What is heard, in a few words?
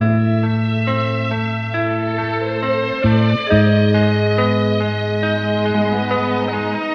strings, Loops; Phonky